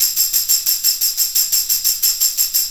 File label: Tambourine